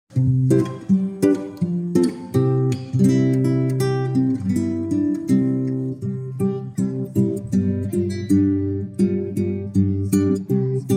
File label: C 83bpm 441hz; adventssingen 2022